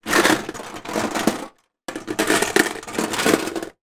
leisure game tin movements; rummy o; zapsplat

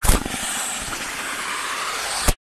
short vacuum suction

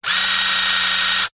science fiction robot motor server movements

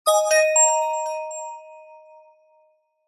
multimedia bell chime alert synthesised positive

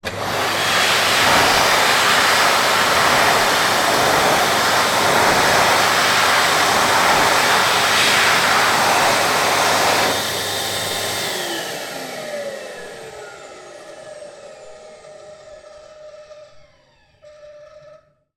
public places hand dryer public toilets operating; sound effects one

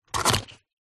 household aftershave card box open; zapsplat

